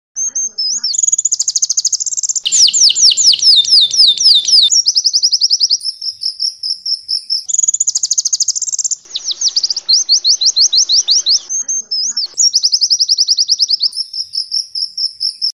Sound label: zipper